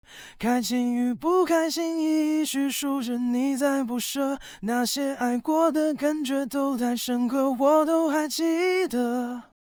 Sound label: AI male; acestudio